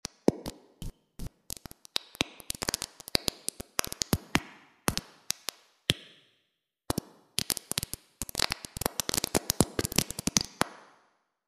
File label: Small hall bright; .mp3 file - 100% wet; freeverb